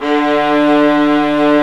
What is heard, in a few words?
STR, Vlas Sect Agitato; Roland L-CD702; Vlas Sect1-2